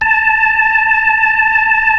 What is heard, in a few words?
B-3, Keyboards of The 60's and 70's; Club Xfade